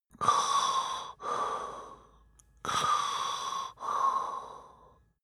male sleep breathe; koerpergeraeusche; menschen; soundpool

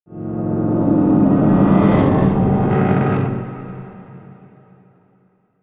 scrapes scary dark, drones

